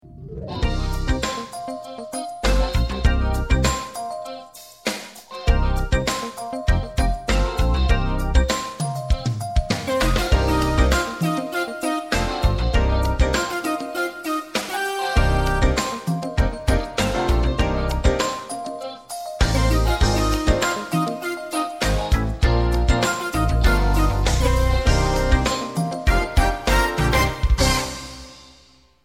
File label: Funky